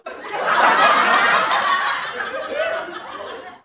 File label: sitcom crowd laughing